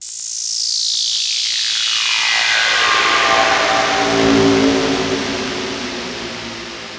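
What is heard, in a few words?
SYN left, Production Effects; Best Service - XXL Production Effects; SYN-FX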